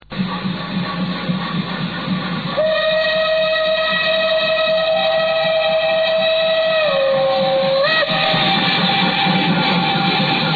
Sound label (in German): dampflokparade